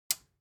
click sound

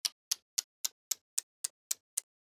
plinko falling

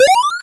warning beep

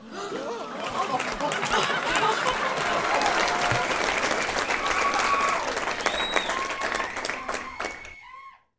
crowd Reaction guild big Reveal; spellbound

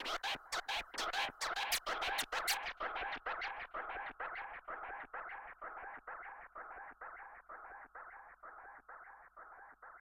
FX, viral hiphop

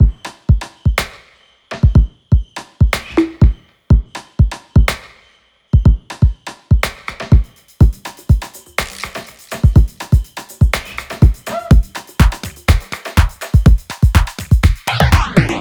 DRUM

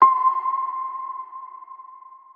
arp

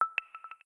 connecting

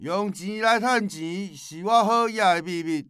store

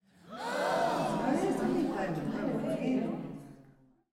crowd Reaction shrine small Reveal; spellbound

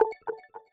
keno result

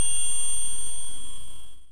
tinkle

